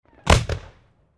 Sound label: fireworks single shorts